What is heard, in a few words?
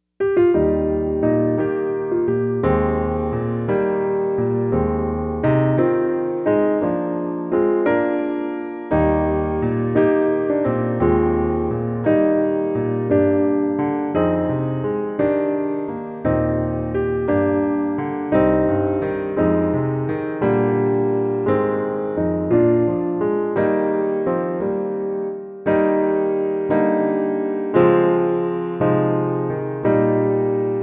PIANO Acc LOW